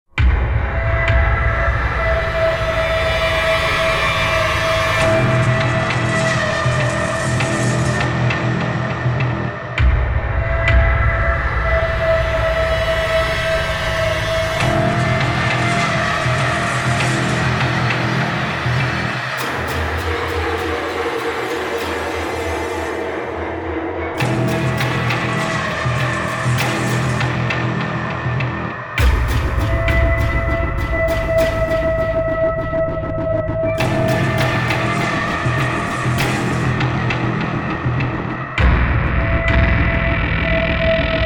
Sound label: Fight